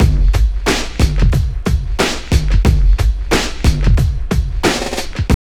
TRIP 90, trip loop

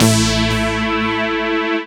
chord A; Techno Trance Essentials; CHORDS

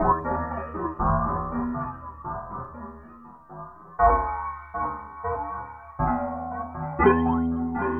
Tape Arp

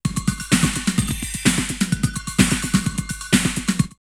drumloops Rave 128; X-Static Goldmine; 90 s Sample CDs

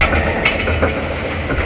MACHINES

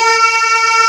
BULGR D5, BULGAR VOX; East West; Global Sounds

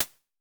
HH Close, Vermona Mono15 Drums Hi-Hats

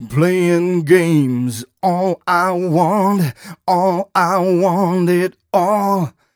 130 mel g, Vocals

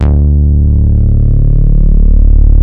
Moog modular; Greytsounds VOL-1

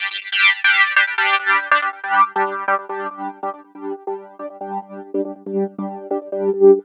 Arp 140bpm G, Trance

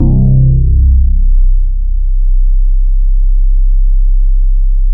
ENDROID BASS, Bass Analog & Synth; AKAI; USB Soundscan vol.12

